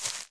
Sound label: step earth